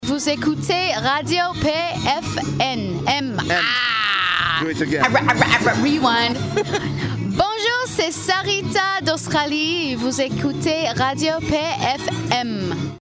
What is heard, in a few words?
jingle; SALRITA AUSTRALIE